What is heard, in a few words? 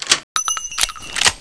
load coach; gunslinger